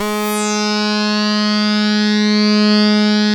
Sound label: saw res dry, synth